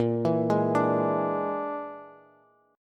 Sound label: A sharp 7th Flat 5th, Bass chord; arpeggiated; JGuitar